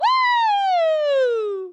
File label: whoooo; Miss Teen Crypto (Randi Hipper)